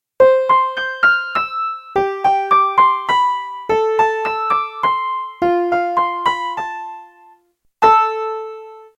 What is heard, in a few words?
Piano; MKS Piano2; Kawai K1